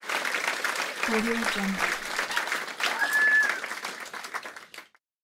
applause clapping

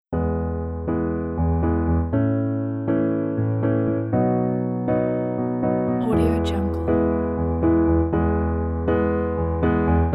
simple joyful piano

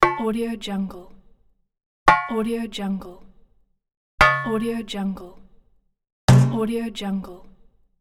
metal tank impact

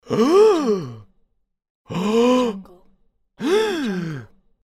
male surprised

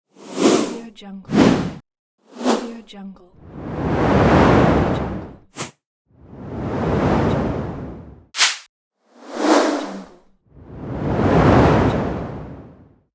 whoosh

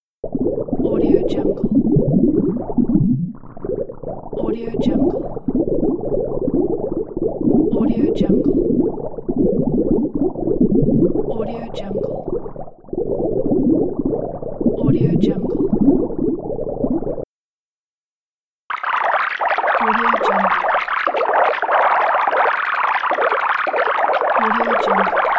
subaquatic; efxideas

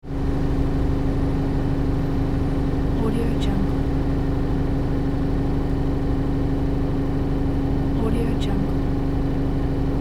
car engine

